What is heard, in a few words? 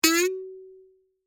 Spring Boing; Texture; Twangs; Sound Effects:Objects